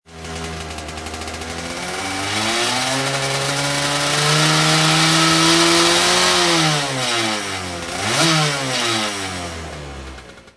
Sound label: kart rev